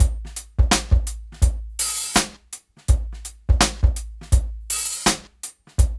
DRUMS, Funky Ass Loops; East West

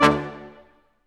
BRS Quintet staccato, Symphony Orchestra

